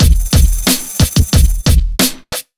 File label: BEAT, Underground Hip Hop